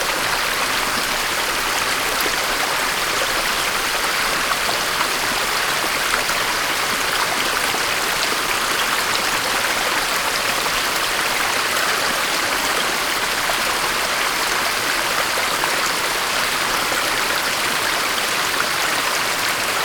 Slide Loop; The Princess and the Frog; wii windows; Hub Swamp; Twitch Skijump

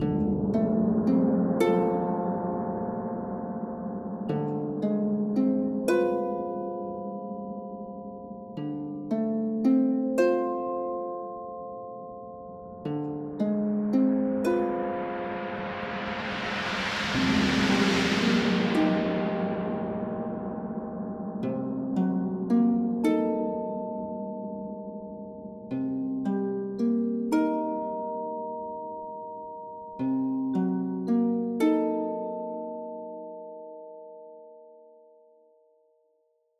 Rnd Harp; Temple I V; tintin reporter cigars of the pharaoh

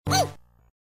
Pikmin Cry; Pikmin 2 Puzzle Card e; GBA

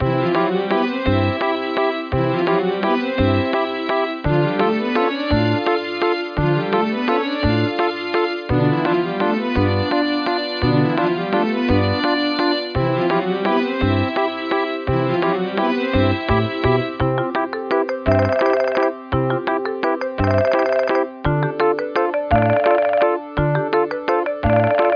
Ride

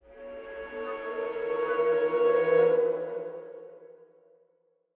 Scary Stinger; Sogport

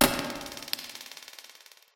defib; badending; Five Nights at Ronald's

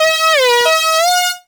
Beat

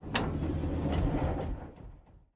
vehicle fishingboat ignition

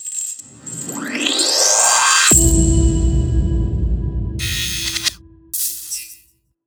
Main Menu Unlock; Hyperdrive Massacre

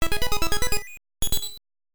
Unused Fanfare; legend of zelda the link s awakening 1993 gb

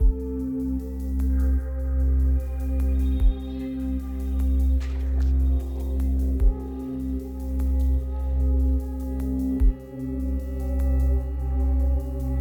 Release Exploration 8CH

